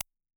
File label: Symphonic